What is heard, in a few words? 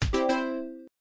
box drop right answer effect